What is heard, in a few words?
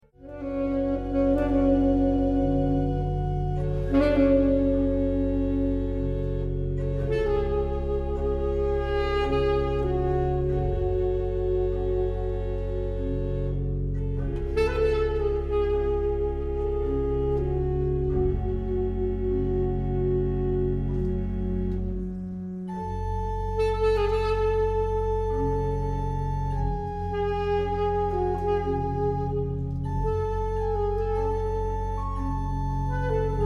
Sonate